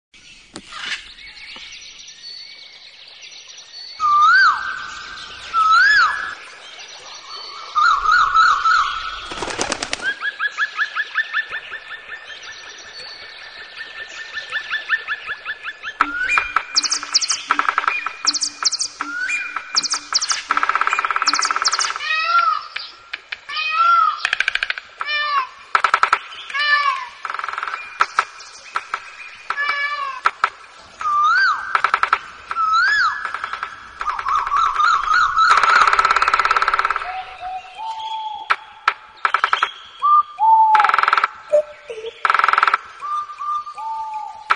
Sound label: animals sound (Repeated thrice)